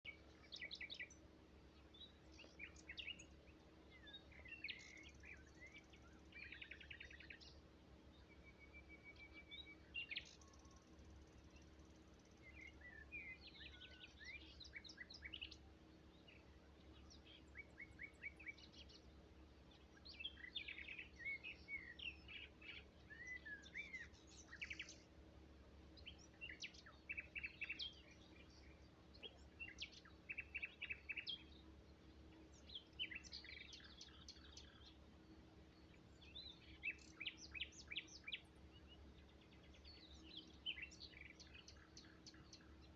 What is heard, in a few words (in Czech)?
Slavík